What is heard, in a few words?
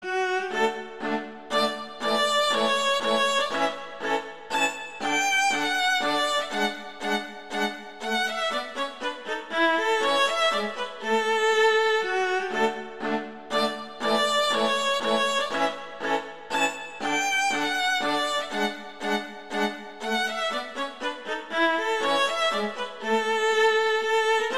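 Duet, Minuetto Allegro, viola; Mazas; Duets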